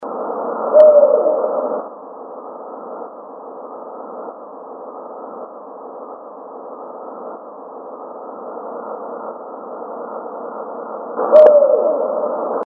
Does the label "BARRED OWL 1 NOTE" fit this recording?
Yes